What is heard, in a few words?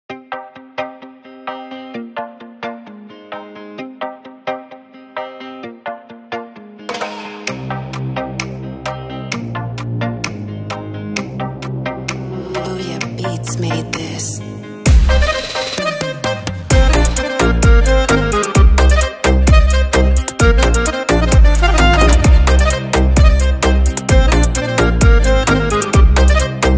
arabic type beat reggaeton balkan type instrumental